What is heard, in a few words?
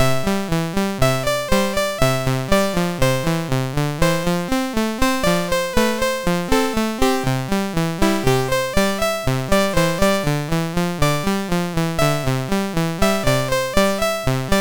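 Ritter Erik, MOS 6581 SID chip music, games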